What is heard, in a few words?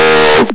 uhh, combine soldier; hl2